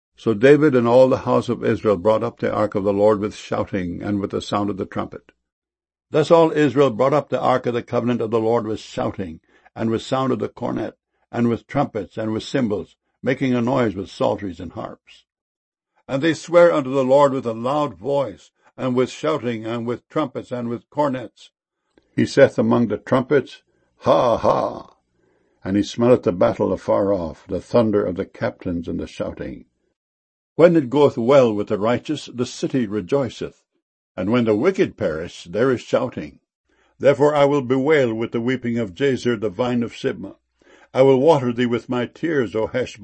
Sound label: shouting